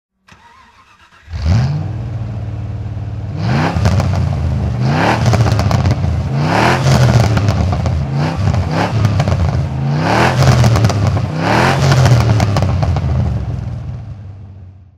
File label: morgan+8 throttle; Morgan +8 3.9 Litre, 1993; Engine sound; V3D/ ClassicarImages; Marc Vorgers